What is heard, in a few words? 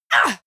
female combat hit soft